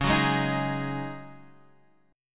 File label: C sharp Suspended 2nd Suspended 4th, Guitar, Half Step Down